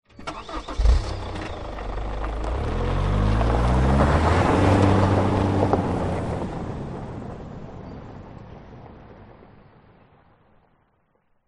transport car suv holden captiva start away fast gravel track